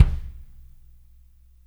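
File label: warm kick; MADNESS KIT; Spectrasonics; Burning Grooves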